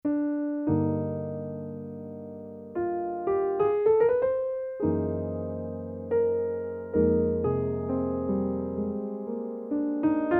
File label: v-piano vintage piano